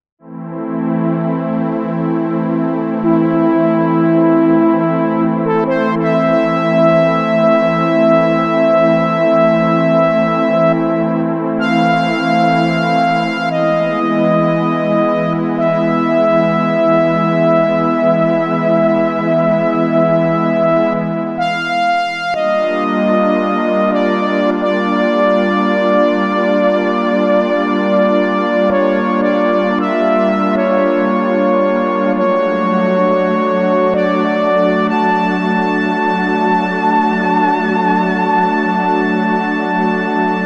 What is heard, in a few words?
Pad Lead